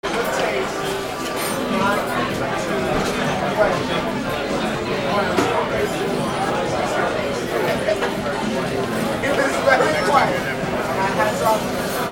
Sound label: AMBIENCE